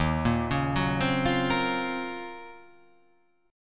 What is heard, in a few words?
Eb Minor 13th, Ebm13 chord; arpeggiated; JGuitar